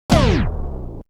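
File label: Turntable; AMG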